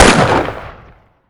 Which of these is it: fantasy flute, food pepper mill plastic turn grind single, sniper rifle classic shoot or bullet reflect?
sniper rifle classic shoot